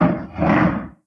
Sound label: Drag Chair; blue archive